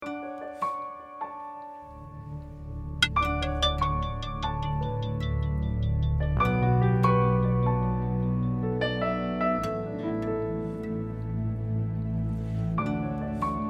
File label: backing